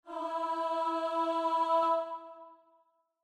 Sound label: starting note, voice; be consoled